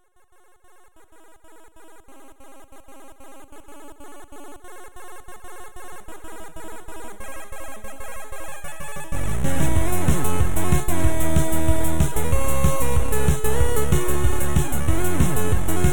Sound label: chiptunes, Music Beds